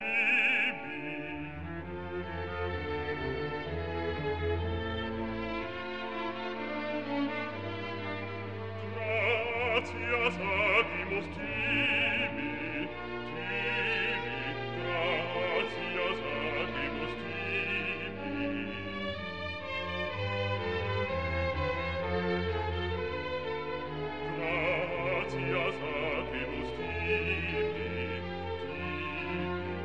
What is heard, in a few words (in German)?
Messe g-moll, mass in G minor; bwv 235